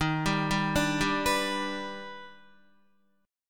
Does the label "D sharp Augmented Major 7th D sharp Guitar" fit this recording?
Yes